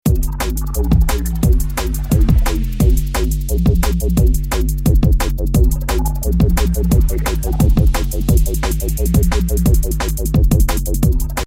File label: Roland MC909 drum n bass